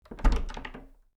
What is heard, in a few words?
door open